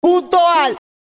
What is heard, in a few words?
ahhhh